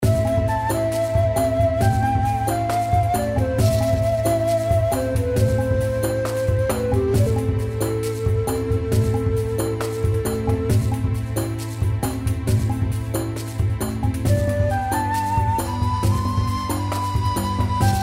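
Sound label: reel spin background, origin of fire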